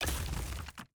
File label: block destruction stone